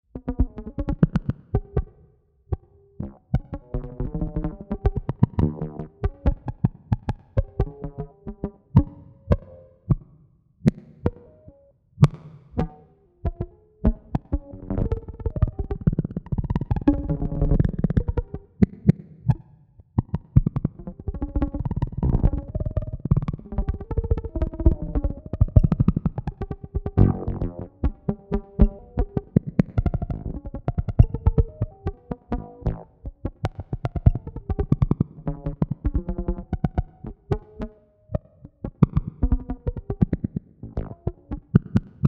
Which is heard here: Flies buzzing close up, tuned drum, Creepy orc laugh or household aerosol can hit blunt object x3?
tuned drum